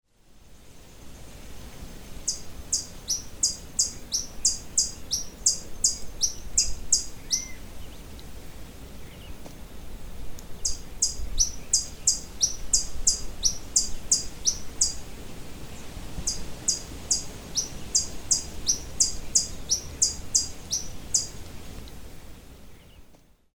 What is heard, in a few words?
Colibri serrirostris Beija flor de orelha violeta